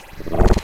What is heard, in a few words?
gurgle